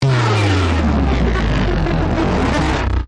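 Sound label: Power Down Squelch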